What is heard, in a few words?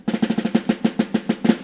Joy, happymusic; 特酷声音素材库; 背景音乐